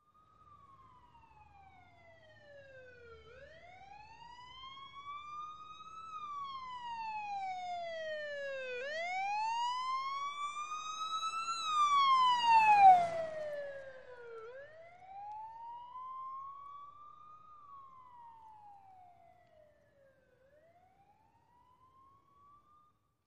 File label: Police Wailer Siren; By, Action Left To Right Fast, Away To Distant Perspective And Off.